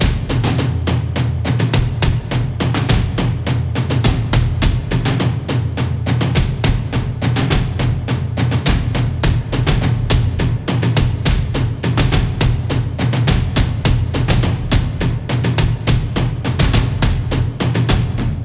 Drums Cinematic Energy Ensemble, Cinematic Trailer Loops